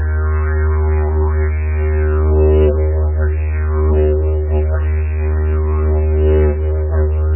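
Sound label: didgeridoo single long with wavering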